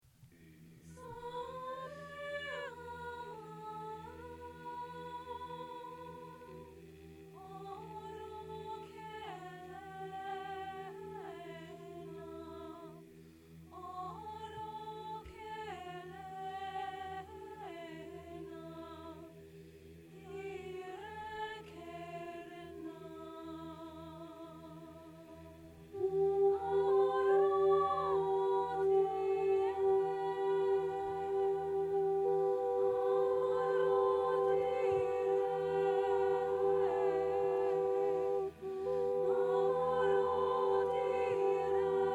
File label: Ederlezi Alto; training recordings